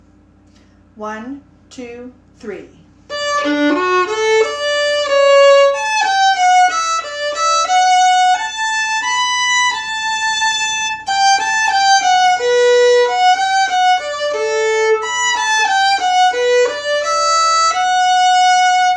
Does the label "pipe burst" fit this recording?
No